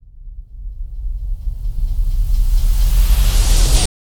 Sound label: Riser